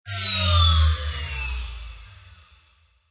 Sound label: Qk Synth Dve, Quick diving sound; Quick Synthy Dive; Rises and Dives (Sound Effects:Sound Design)